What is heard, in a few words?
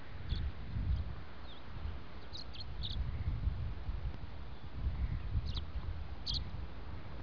tree swallow